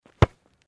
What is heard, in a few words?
kick ball